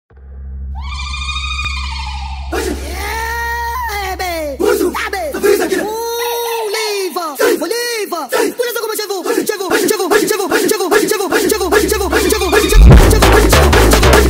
brazilian phonk sped up

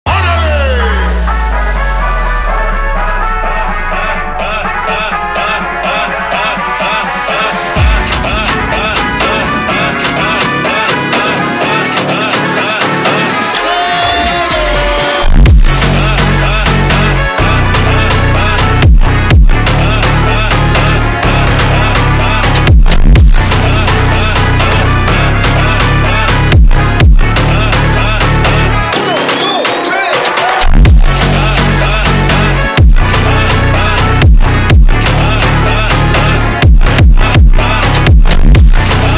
slowed

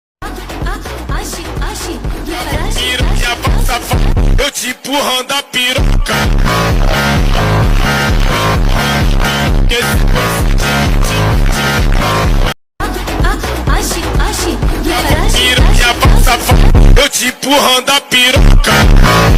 phonk

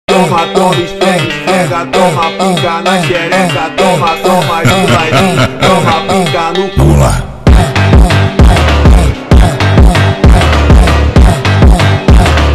brazilian phonk

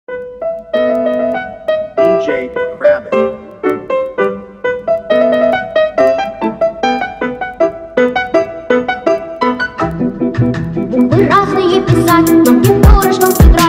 trap remix bass

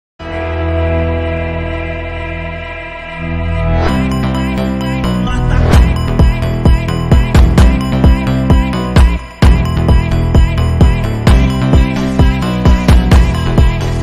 phonk music

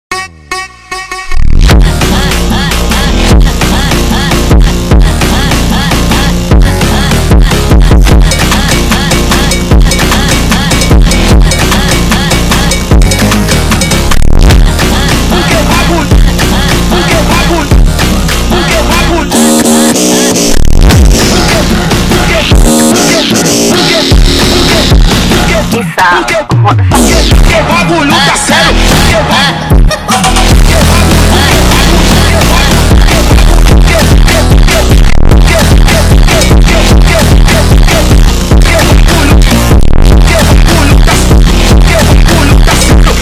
batida insana phonk

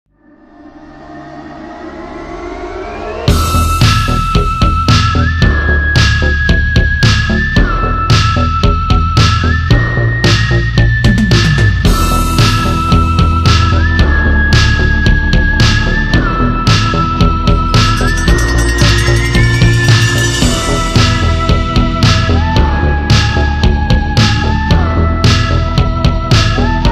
halloween spooky 80s

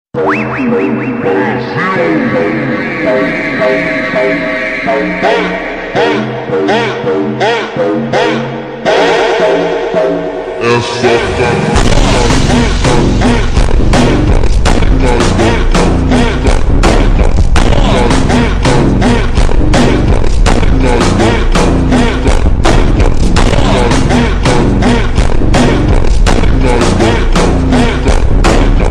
slowed reverb brazilian phonk

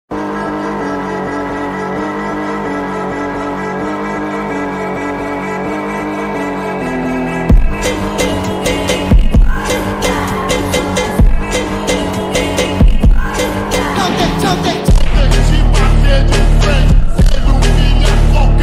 brazilian phonk slowed tiktok remix

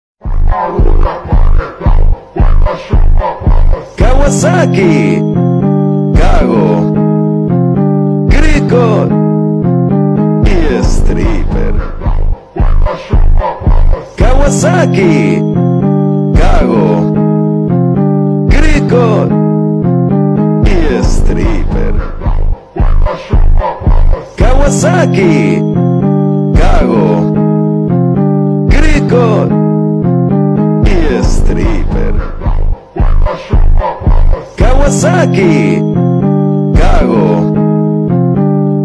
fonk

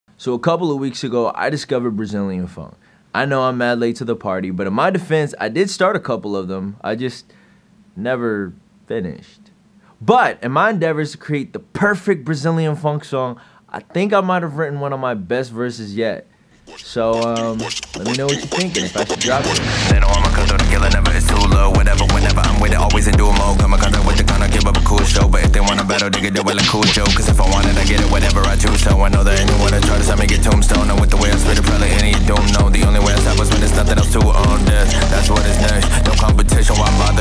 brazilian phonk

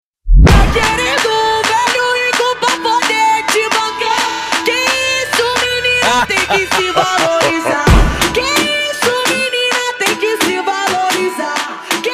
brazilian phonk

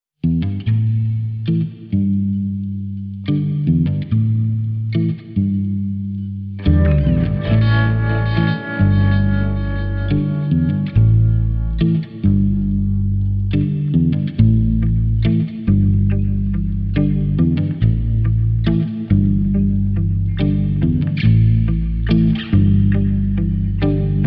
blues

